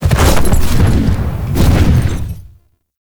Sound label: Horse Glide Start; Warframe; Duviri Horse; Vehicles